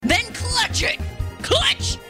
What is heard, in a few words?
clutch